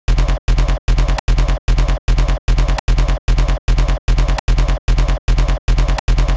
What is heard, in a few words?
Bass